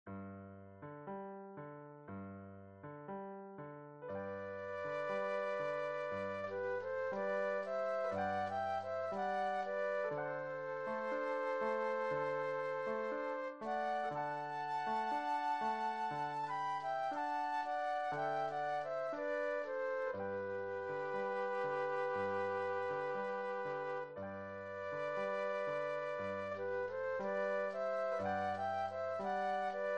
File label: Flute Duet and Piano